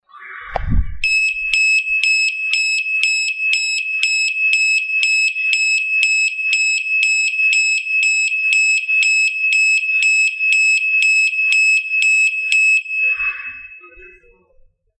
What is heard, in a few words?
Fire alarm